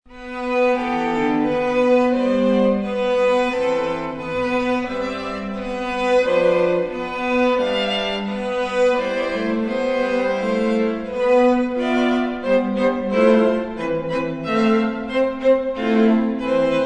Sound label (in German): streichquartett; darboven